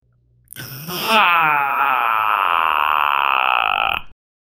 male defecating sound

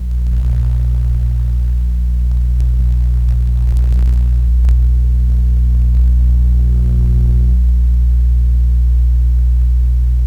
low level broadband hiss, analog

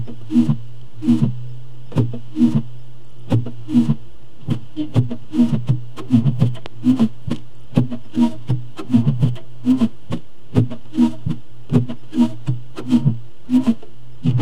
interior recording of car, windshield wipers

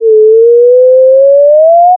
healing wave

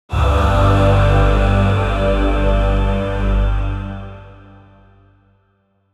deep gothic mens choir, level up

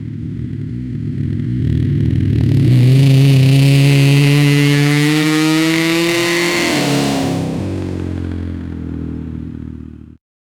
yamaha 250 sound rev, engine sounds

